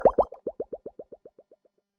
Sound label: bubble